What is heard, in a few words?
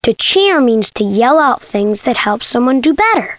CHEER; Get Ready for School, Charlie Brown!